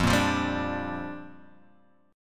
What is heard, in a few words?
A sharp 6th F